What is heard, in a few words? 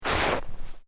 Footsteps Boots Snow Deep Step